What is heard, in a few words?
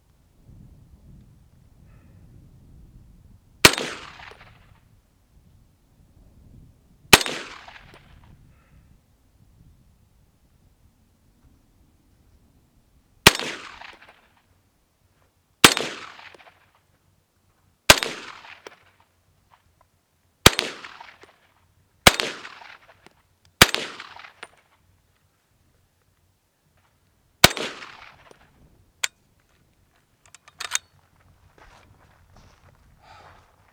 M1 carbine